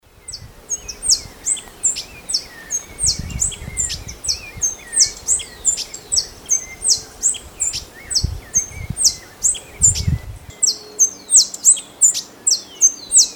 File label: Poospiza cabanisi; Las Cumbres de Punta Ballena